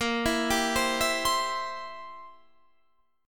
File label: C Major A sharp; arpeggiated